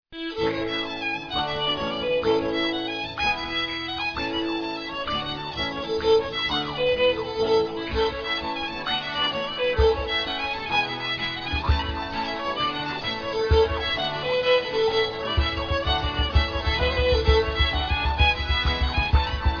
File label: capstan jig